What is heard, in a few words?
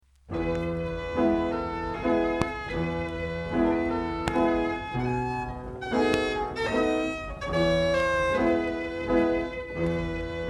Phonoliszt Violina